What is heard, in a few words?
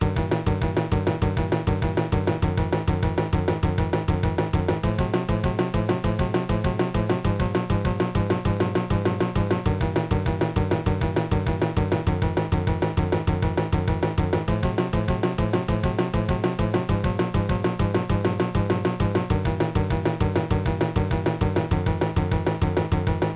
Boss Theme; SMA